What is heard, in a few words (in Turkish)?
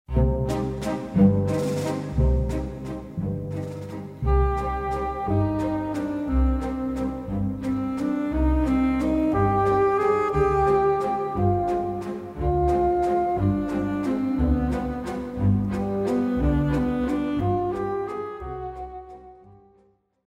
SANTRAL MÜZİK